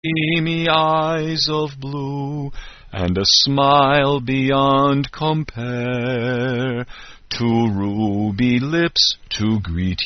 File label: Fraternity Sweetheart Song; Phi Gamma Delta; Fiji Rose